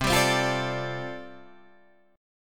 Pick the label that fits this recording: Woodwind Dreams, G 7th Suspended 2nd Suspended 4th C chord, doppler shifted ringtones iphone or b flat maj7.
G 7th Suspended 2nd Suspended 4th C chord